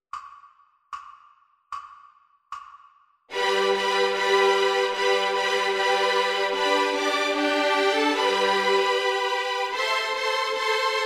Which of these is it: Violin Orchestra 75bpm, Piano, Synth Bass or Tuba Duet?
Violin Orchestra 75bpm